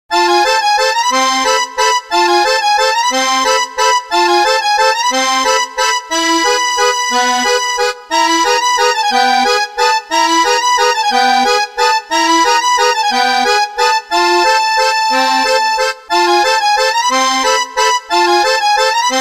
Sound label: Question Music